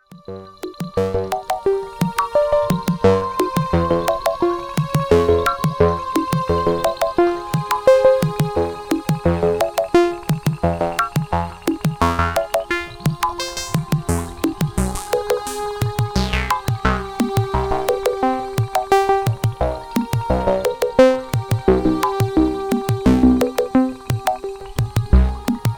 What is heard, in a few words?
sh5 Mix